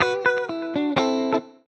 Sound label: ELEC GUIT ETHNO 125 A, Guitar; eJay